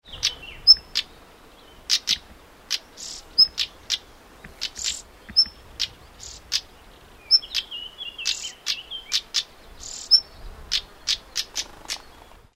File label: stonechat